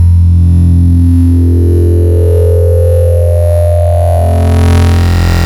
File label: Harmonics Crispy Sines; Ableton Live 12 Lite; Vector; Sprites